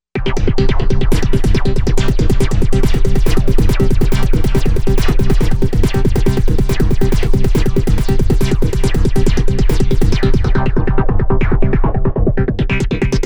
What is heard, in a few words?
Noise Shot